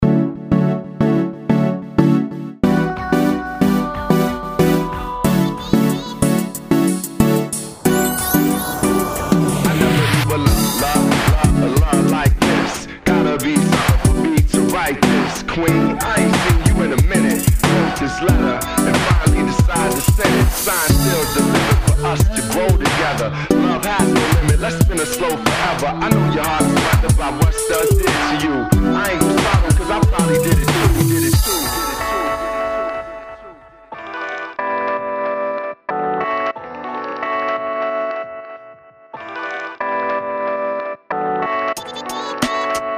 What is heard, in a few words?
Hip-Hop